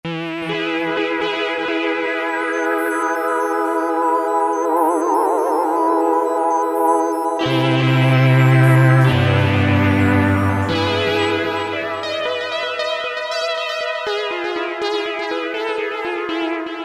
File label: Euro Synthe, Korg MS2000; Factory Patches